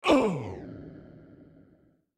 Hurt; Hustlebonez Hurt 5 New

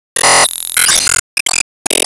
Change Clap; disney star smash android ios mobile gamerip 2020

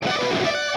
Guitar Cheer

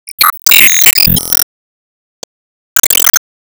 Enemy Time Magic Active Clock Ring

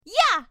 Jumping

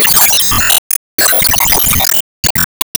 Fistball First Hit; Disney Star Smash; Android, iOS, Mobile; Hades

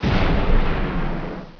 Die; Virus: The Game

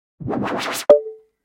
Result Count Generate

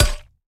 Weapon Ceramic

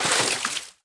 Hoverboard Loop Water Splash Out; Subway Surfers City; Android, iOS

